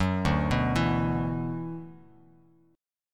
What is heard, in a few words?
D 6th Add 9th D, Bass Standard; D6add9 arpeggiated; JGuitar